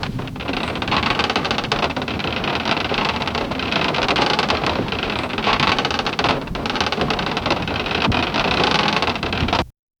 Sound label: continuous rhythmic, machines